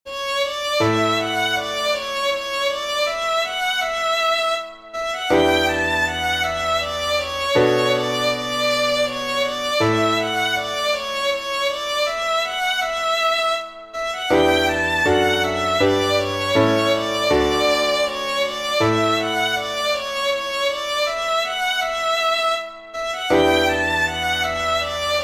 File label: polonessa